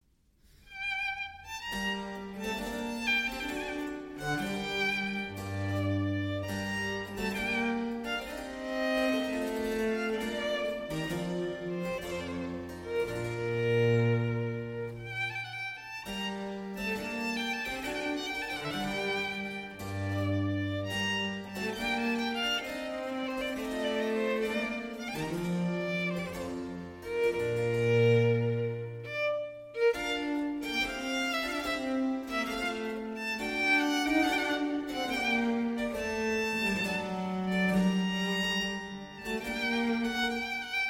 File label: Violin Sonata in G Minor, Adagio; RV 26; Vivaldi